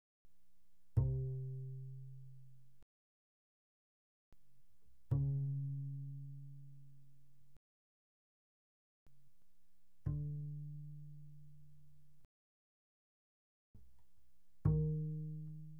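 Bass pizzicato mezzo forte sul D C3 B3, doublebass; Strings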